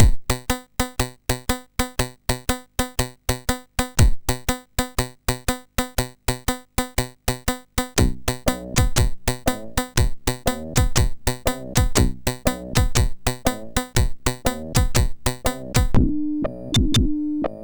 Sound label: Reggae Me sid MOS6581